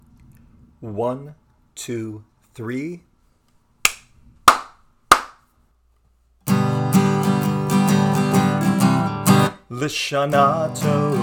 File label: L shana Tova with claps